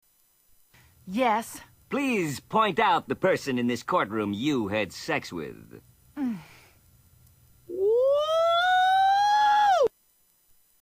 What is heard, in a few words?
woo; fry